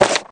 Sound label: book look